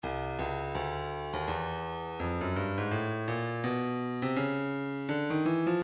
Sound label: breakbeat midi 083 bpm; Oppressor E; Stylus Breakbeat Midi Files